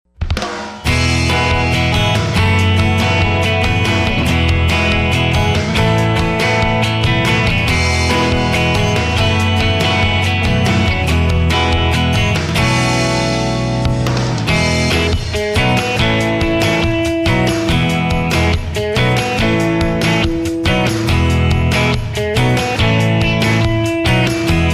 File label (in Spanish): Pop; honduras musical 9no grado